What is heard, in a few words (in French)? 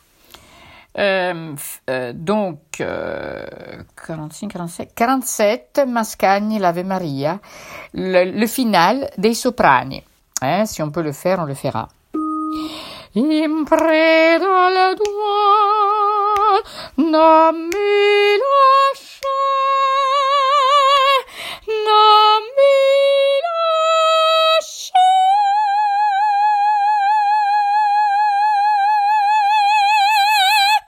ave maria finale sop; mascagni